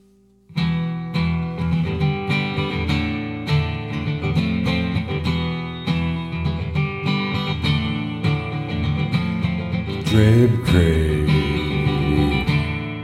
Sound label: Jam; r1 studio sessions